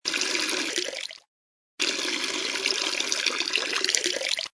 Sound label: Peeing Cow Moose